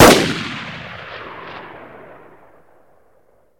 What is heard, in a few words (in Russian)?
gaubichnij zapusk snaryada po celi